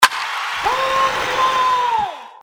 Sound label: dice; LG GS500 Cookie Plus; Game